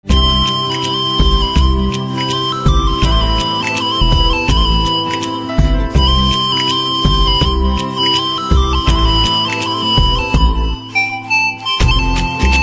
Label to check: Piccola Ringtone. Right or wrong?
Right